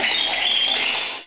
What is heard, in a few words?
SQUEAK, Anime Sound FX; COMIC